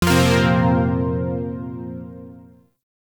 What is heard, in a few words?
Shawzin Blues Chord C; Zariman Shawzin